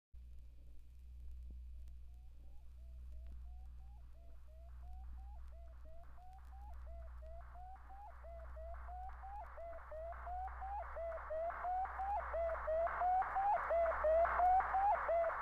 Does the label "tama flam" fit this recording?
No